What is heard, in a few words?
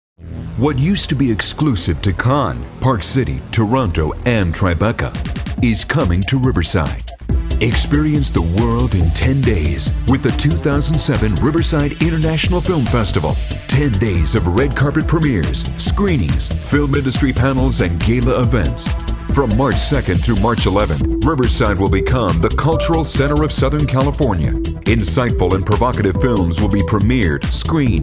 RIFF